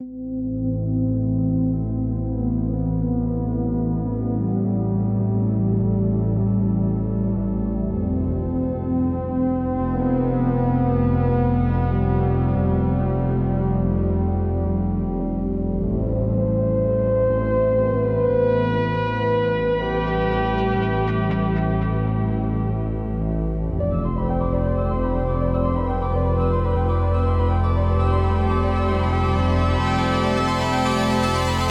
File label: Electronic Impulse